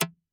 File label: Perc Reso, Vermona Mono15 Drums